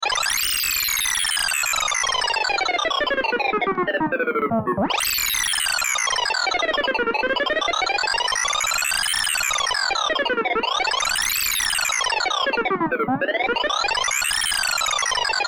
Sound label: Science Fiction Communications Scrambled Radio Beeps